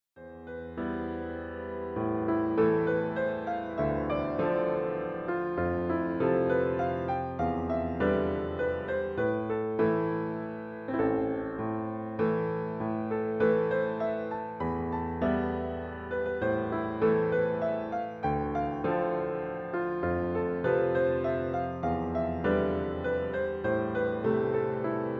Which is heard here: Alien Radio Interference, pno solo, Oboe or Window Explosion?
pno solo